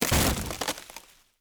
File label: foliage break